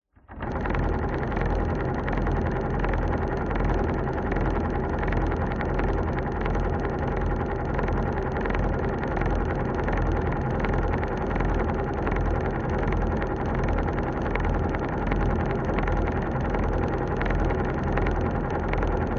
industrial machine motorised whir cable lift wooden on operate off; zapsplat